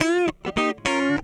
FUNK, FUNKY GTR

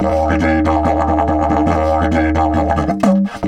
EF 140, DIDGE RIFFS

